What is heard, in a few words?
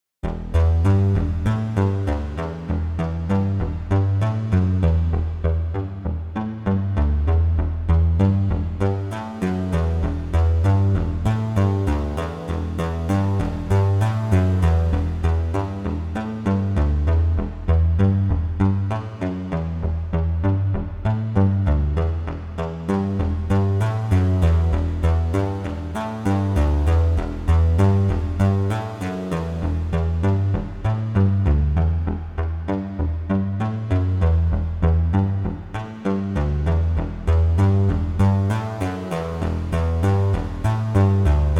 Eventide SP2016 Plate Modern